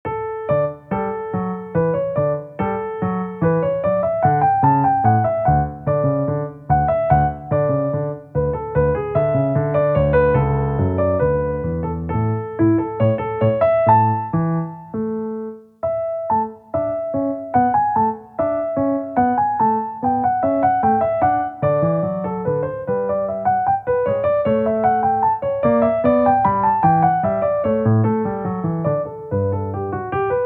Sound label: March in D Major, piano; BWV Anh. 122; Bach; piezas faciles piano